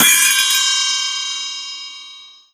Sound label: Gamelan Cymbols; Synthetic's Serum Collection; Ronny J Noises